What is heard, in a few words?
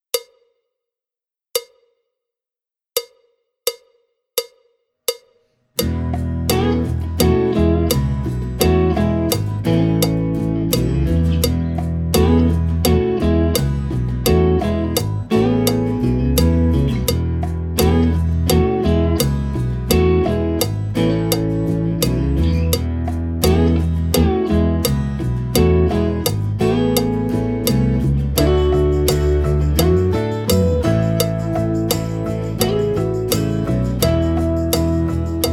backing track with click